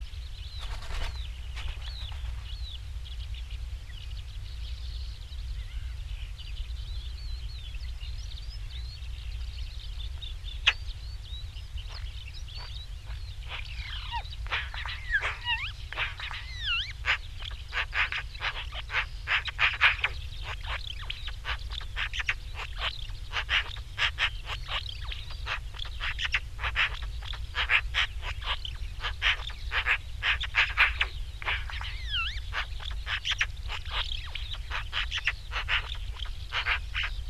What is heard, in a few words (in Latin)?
Pica pica; SETE